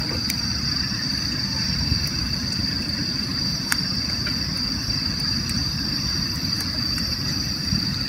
Autumn Night

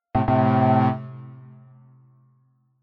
Wrong

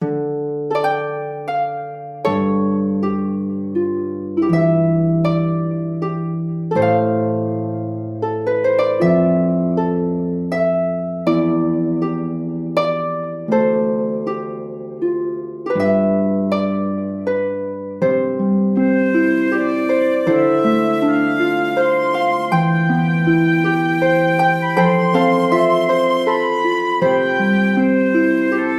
sleep with kind feeling

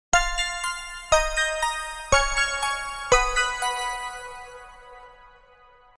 Magical girl

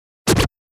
Scratch

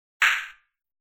Explosion game

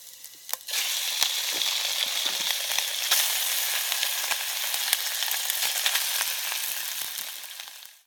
Bake meat in a frying pan

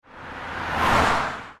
Car passes fast

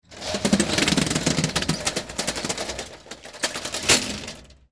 Up and down shutter

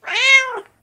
Cat sound

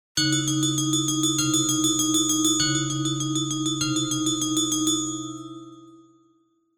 Music box

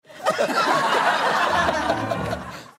laugh

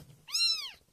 Small cat

